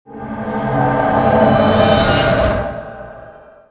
summoning; dark souls

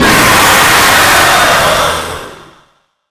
screamer; phantom jaiden

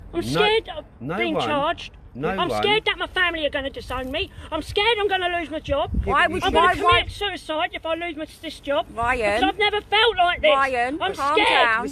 scared long

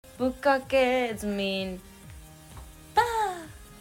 ayakapaahhh

